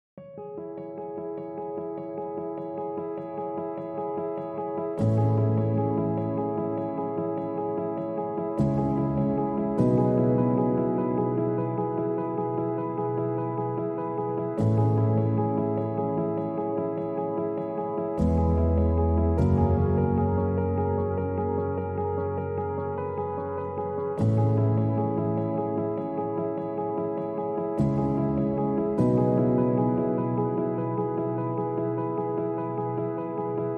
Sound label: Pianos Only